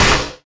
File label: radio impact lg, metal; physics; Counter-Strike Global Offensive